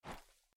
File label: step; snakeinabox; double time pitch shift